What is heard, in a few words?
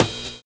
BUZZ FILL; Univers Sons - Kitch 70s